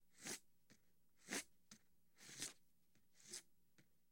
Cards Slide From Shoe

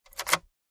ignition key

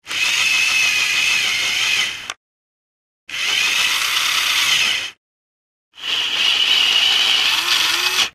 Electric Motor Revs

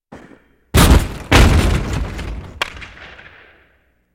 Hockey Player Skate

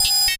Computer Button Key Swi; communications

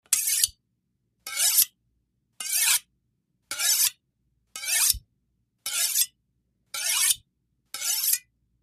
Sharpening carving knife, slow

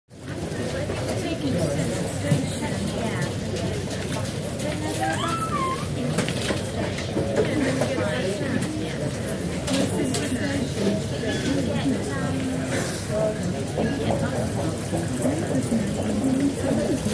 Airport Air, int airport; Airport Heathrow Air

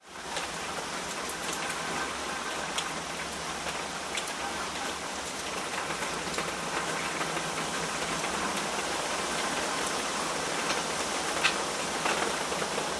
rain light; rainfall

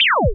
laser; lasers

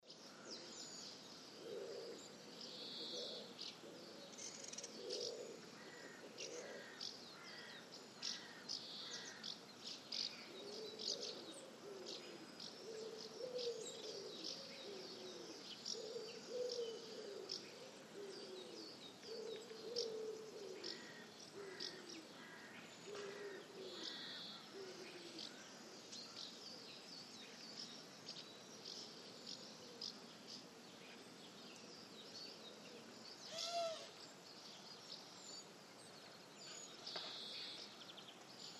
Pigeons Ringed Doves

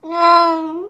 Cat Domestic Meow Sad

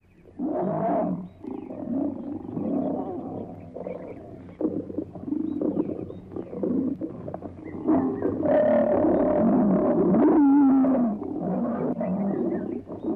Lion Growls Moans Dis; big cats

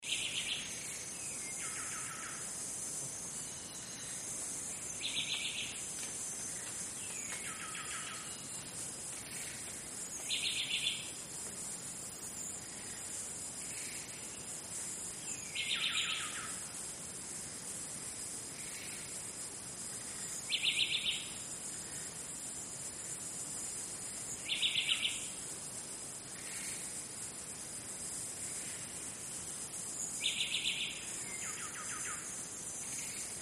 Amazon Jungle Insect

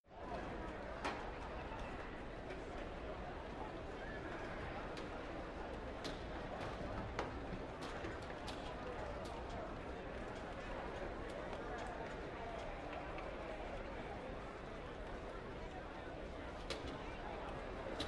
Crowd, Hockey; Quiet Crowd, Idle, Pre-game.

